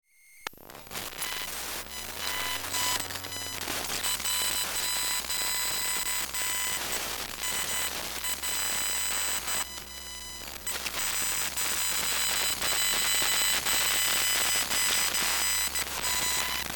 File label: Laptop glitches and noise